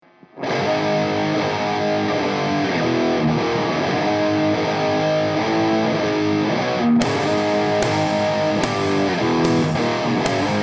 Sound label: SM57; vh4-2